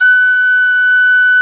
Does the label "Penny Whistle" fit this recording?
Yes